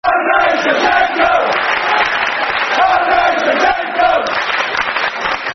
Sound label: Football Chants, Chelsea; fanchants-com